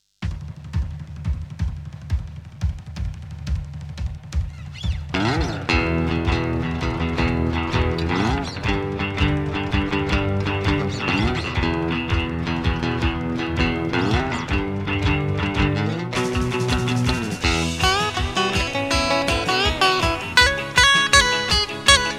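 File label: driving guitar; ＮＥＷ ＳｔｒａｔＧｕｉｔａｒ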